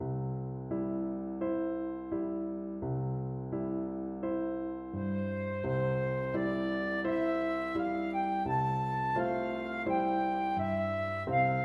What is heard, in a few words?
irish lullaby, Flute